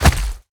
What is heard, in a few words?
Body Falls Arm Mud Dry; BIGMOUTH